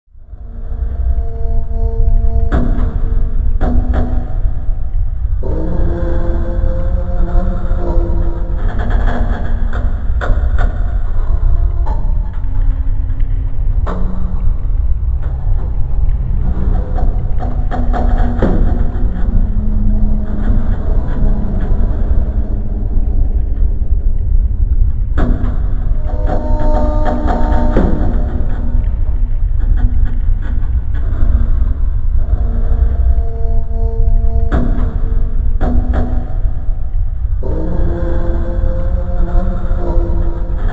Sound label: atmospheric, ambience